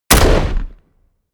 shot

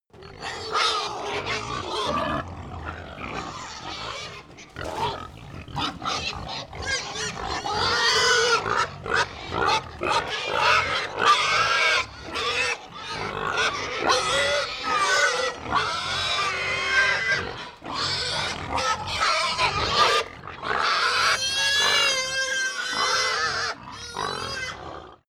pig herd grunt scream many, pig

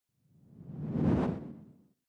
whoosh simple flutter low

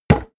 hit wood quarterstaff medium

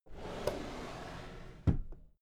drawer cabinet equipment close soft

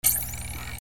machine scream

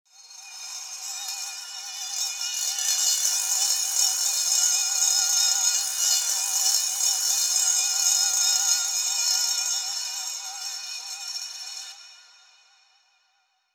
twirl

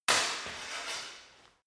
sword hit scrape sword

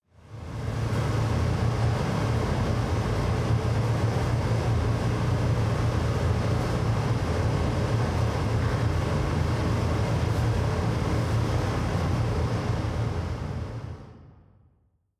factory hall

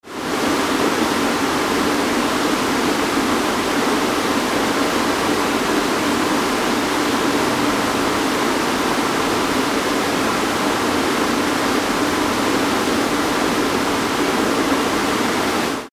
waterfall

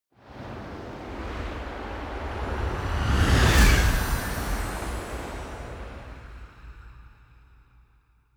whoosh magic gust

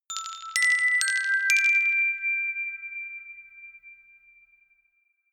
notification glockenspiel rattle sequence jump

